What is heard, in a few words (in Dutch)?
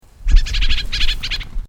eksterbabbelaar nagedaan door drongo